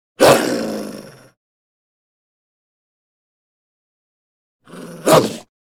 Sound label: Wolves